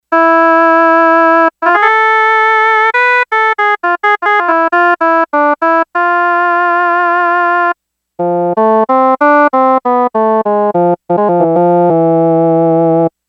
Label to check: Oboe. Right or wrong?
Right